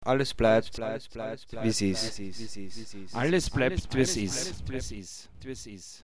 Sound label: vocals